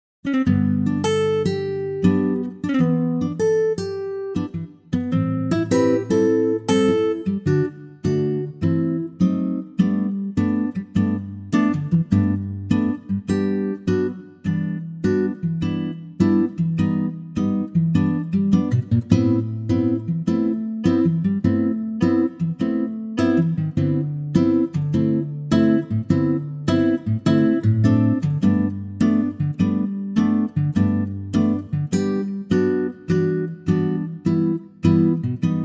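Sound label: acoustic guitar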